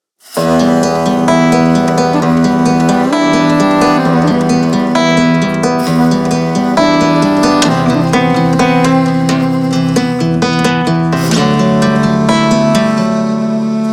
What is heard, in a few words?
acoustic live